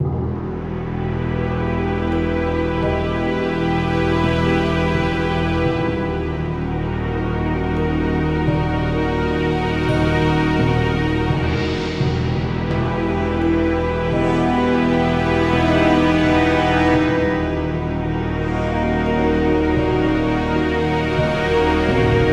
Horror; Sherlock Holmes The Awakened

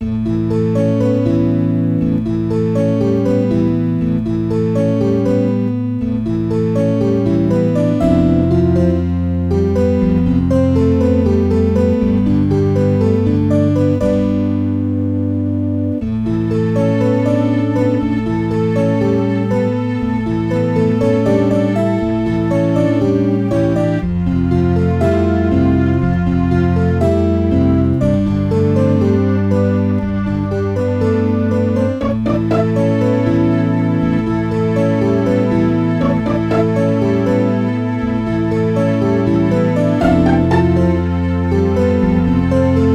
Music Loop; Emma in the Mountains; NDS

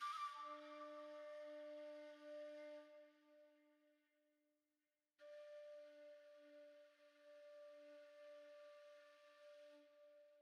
Flute; Frozen Flame; windows

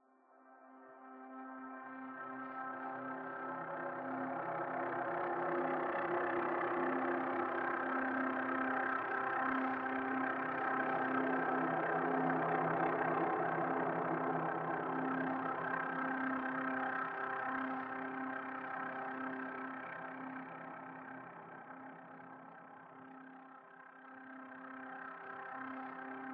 Vibro Sample; The Sinking City

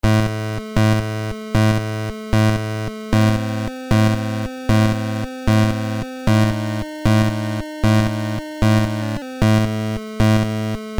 Suspenseful Area; Space Shadow; NES

